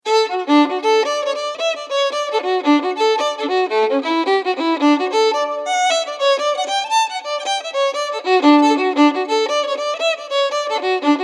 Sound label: Sean Ryans Jig